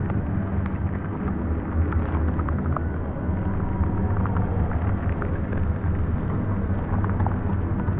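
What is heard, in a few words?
necroid ambient corvette hum; Stellaris; necroids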